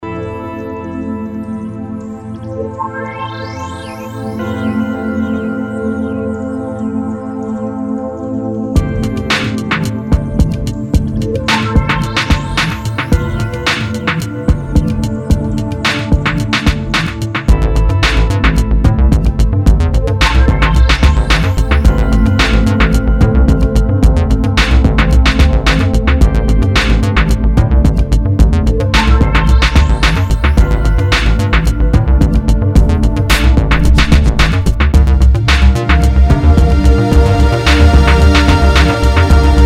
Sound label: pad synth electronica